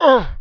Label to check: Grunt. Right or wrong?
Right